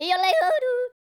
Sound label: YODEL, World Voices; ILIO; Vocal Planet